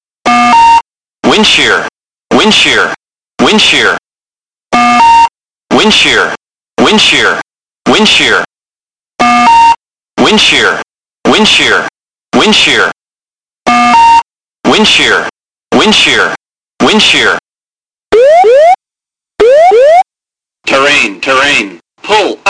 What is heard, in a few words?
windshear